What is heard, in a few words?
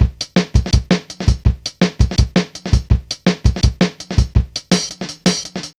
Drum Loops, Jungle